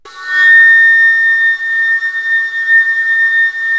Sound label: ALTO A5, ALTO FLUTE; USB Soundscan vol.23